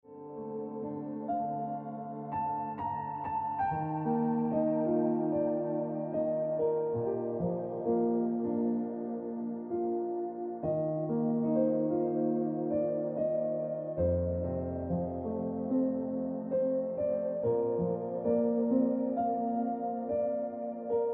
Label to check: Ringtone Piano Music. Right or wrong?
Right